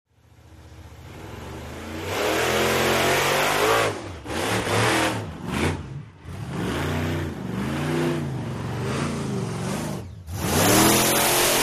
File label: Monster Trucks; Revving By, Monster Truck Revving, Races By Thru The Obstacle Course; Shot Cu From The Obstacle Course.